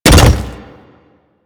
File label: Pet Land; Warframe; Alad V Pet